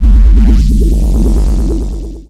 door; Shin Megami Tensei IV Apocalypse; 3DS

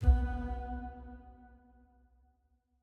Stinger Neutral B; Desert SFX

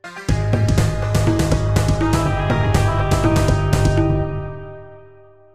Lose Life; Donkey Kong Country 4 The Kongs Return Demo 2; Kremlahari

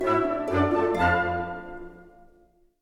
Music Dialogue Inlove End 64bpm; asterix obelix slap them all 2

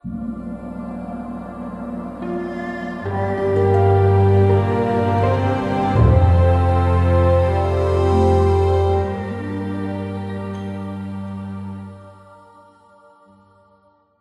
Memory Positive Start Shortest

